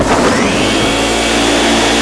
Vacume Cleaner; GAMECUBE; LUIGISMANSION

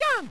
jump; GBA; MLSS; mario

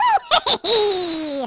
Uh heeheehee; DS; NEWSMB; Bowser Jr

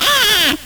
baby cry; baby mario; DS; MARIO LUIGI PARTNERS IN TIME

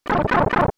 pipe; mario; SNES; SMRPG

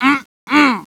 "mmhm"; DS; ML BOWSERS INSIDE; Luigi

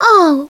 ohh; DS; ML BOWSERSINSIDE; Peach

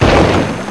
box bust; GAMECUBE; SUPERMARIOSUNSHINE

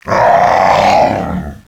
gwahhh; DS; ML BOWSERS INSIDE

